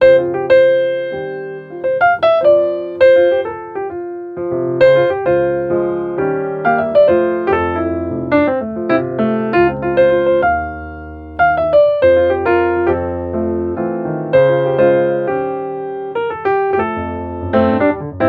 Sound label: Piano Suite, phonetones alarms; LG X power 3